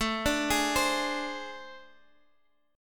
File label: A Minor Major 7th Double Flat 5th, down